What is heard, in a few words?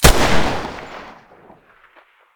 muzzle deagle mono, weapons